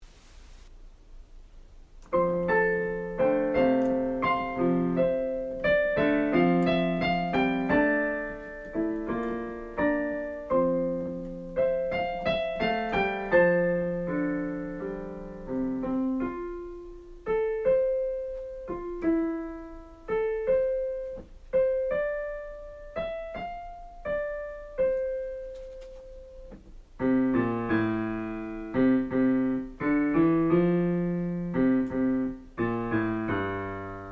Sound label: Bass